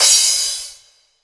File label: Crash, Cymbals